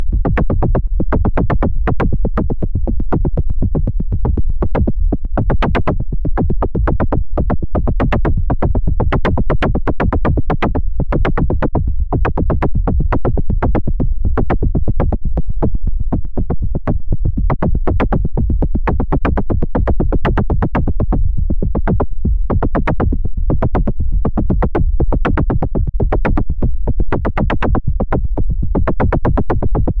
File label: drum